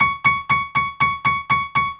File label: Acoustic Piano